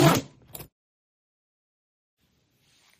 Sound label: neural link connect; Sci-Fi